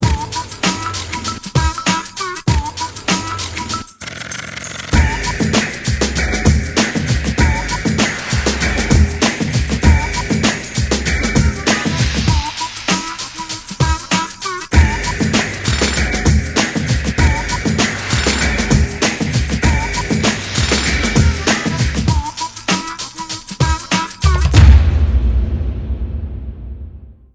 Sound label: industry groove